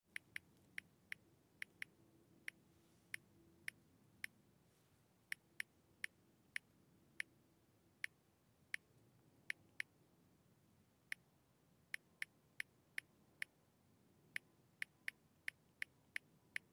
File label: samsung galaxy typing